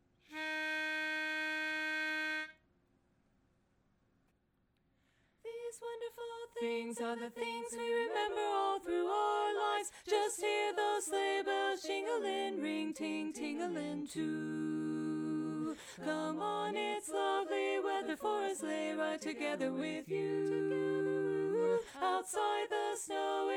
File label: Bari Dominant; holiday music